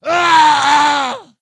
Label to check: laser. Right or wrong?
Wrong